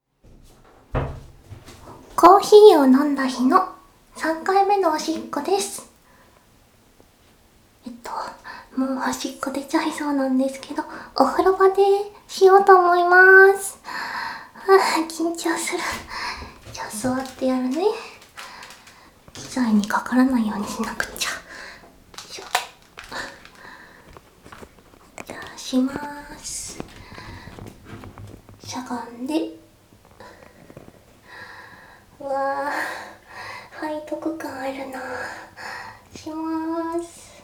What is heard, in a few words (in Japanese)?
コーヒー飲んでからおしっこしちゃうの